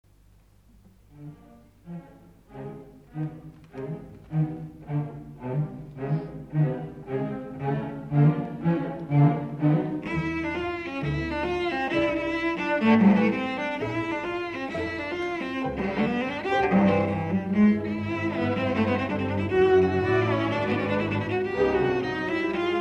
Koncertas violoncelei; Trinkunas; Klova

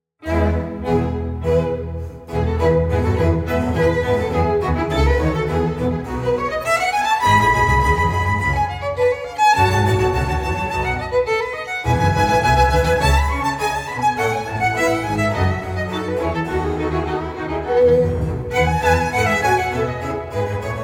koncertas smuikui E-dur; Bach

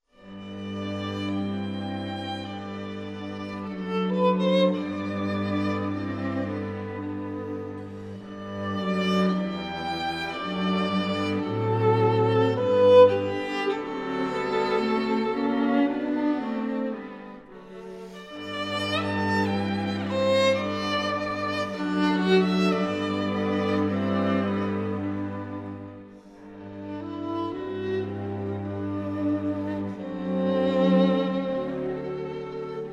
kvartetas; M. K. Čiurlionis

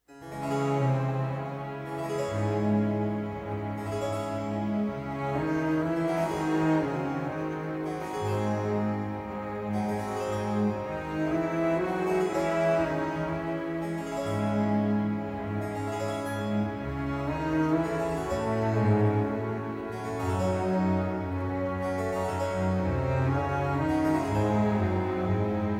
koncertas smuikui E-dur; Bach

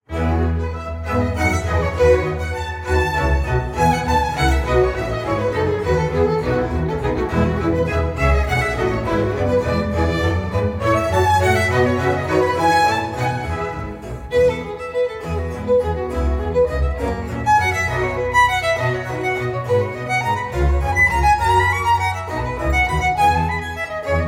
koncertas smuikui E-dur; Bach

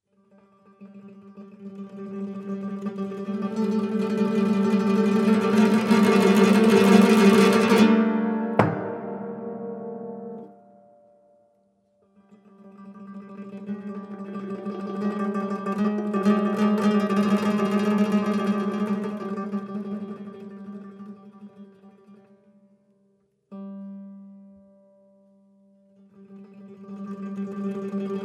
Koncertas; Kurian

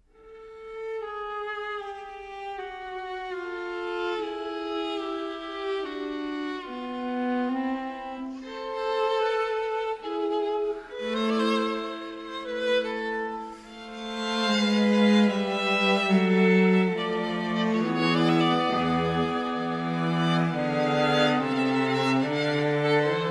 Styginiu kvartetas A-dur; BOCCHERINI; II Andantino

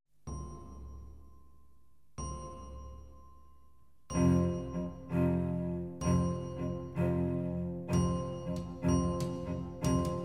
Kalėdų giesmės Bell Carol

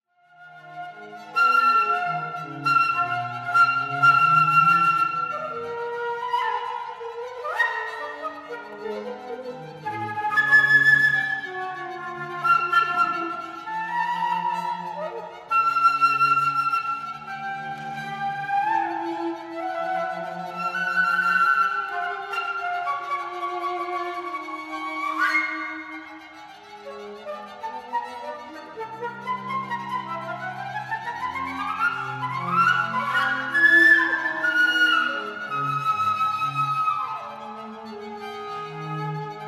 Muzika fleitai

